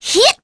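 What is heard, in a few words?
Jump, Korean; heroes; Shamilla; kings raid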